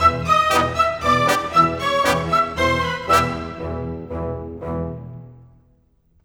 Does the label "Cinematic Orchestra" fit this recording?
Yes